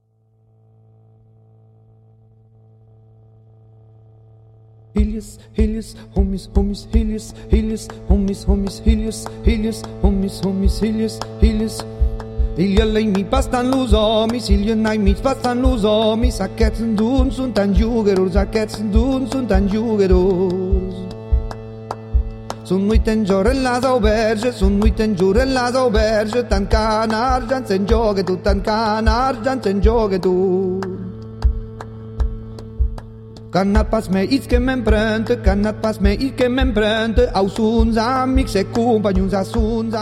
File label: canto